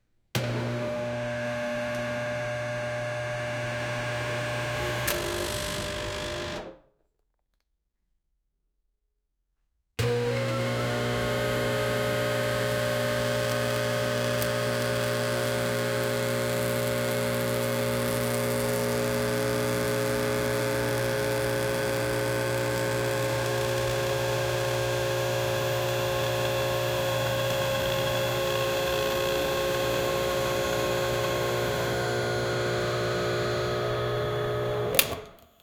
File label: barista tamping espresso, coffee